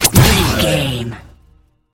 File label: sci-fi shield power on impact